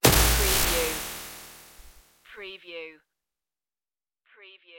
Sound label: futuristic bullet impact